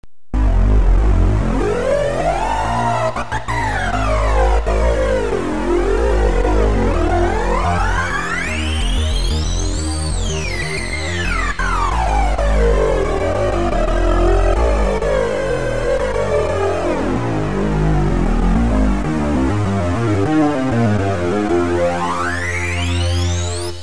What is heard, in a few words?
nordlead2x